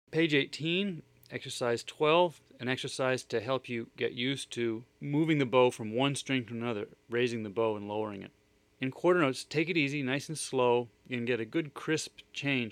Open String Exercises D A Quarter

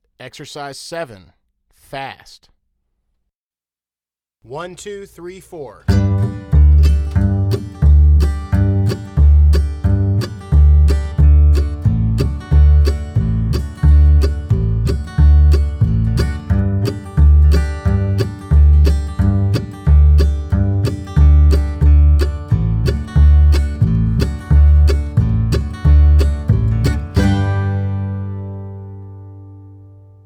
Exercise Fast

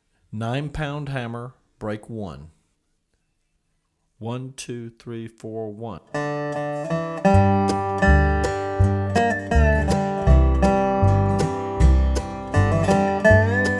Nine Pound Hammer Fast

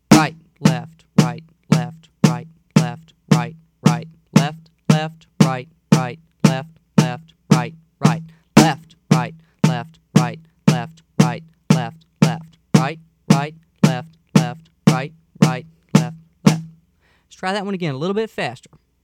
Slow

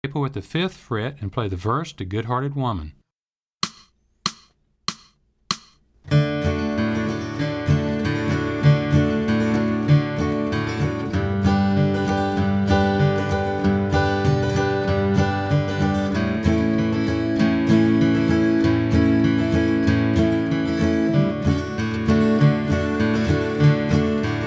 Capo At 5th

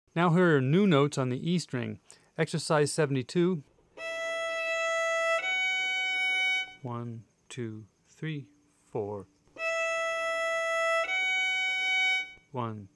Exercise New E String Notes

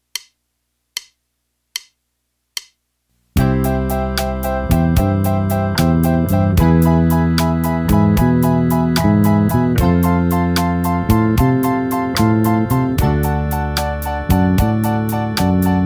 Slow Rock Fast

